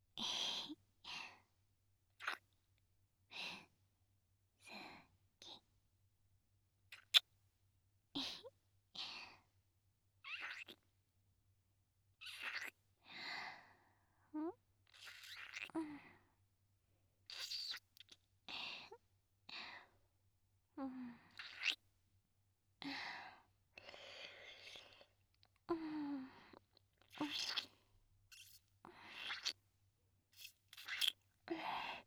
キス; SEなし

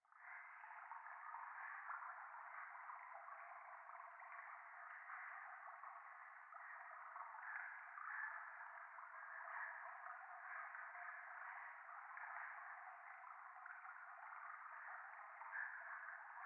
右耳快感吐息 川のせせらぎ; 廃墟

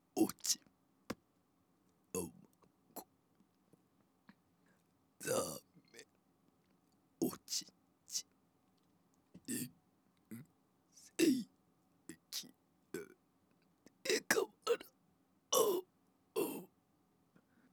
ゲップ淫語 ゲップのみ 淫語ゲップ含

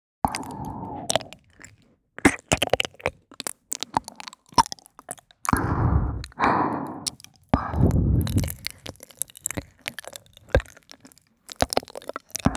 耳舐め; 渚のラブラブ耳舐め♪

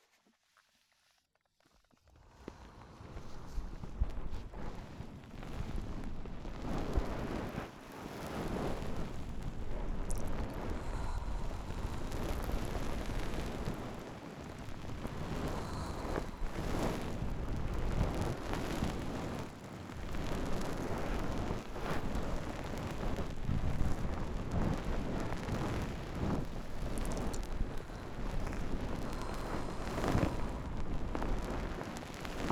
海綿スポンジでお耳ごしごし

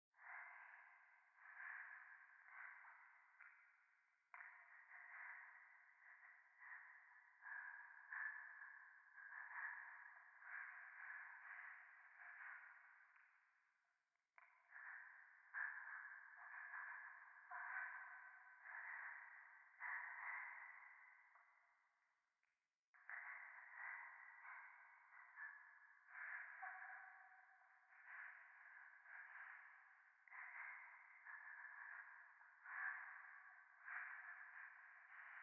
廃墟 右耳快感吐息

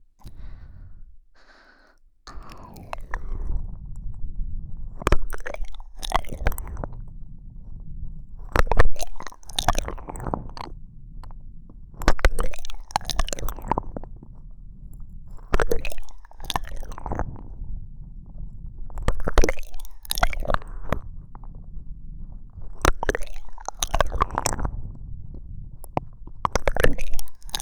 ぐぽぐぽ

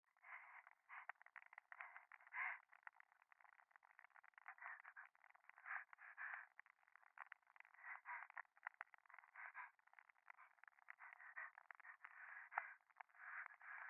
左耳快感吐息 暖炉

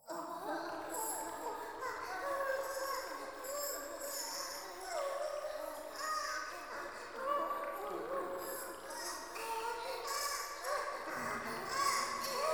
低音喘ぎ 川のせせらぎ

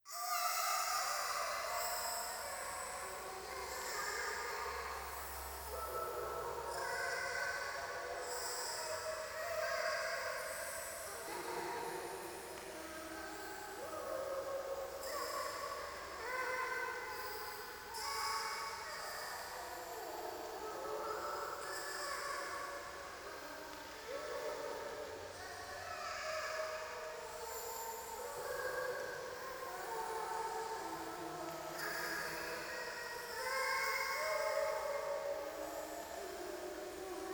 ゴースト シャブ喘ぎ 雨

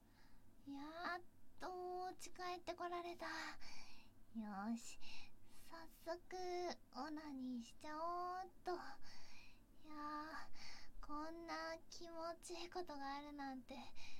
オホ声連続絶頂, オナニー; 覚えたてのオナニーが気持ちよすぎて毎日変態おまんこをイジっています

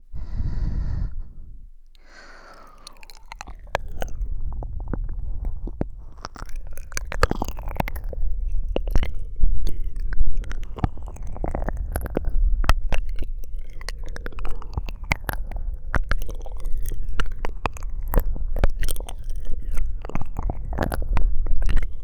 ゆっくり耳奥舐め

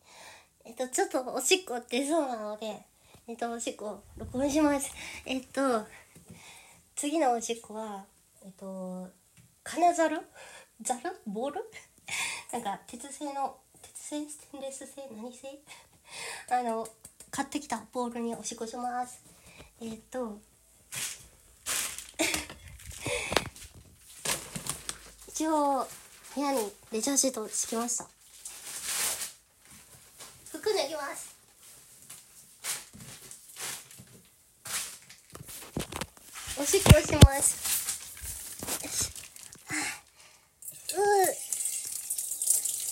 金属ボウルにおしっこ

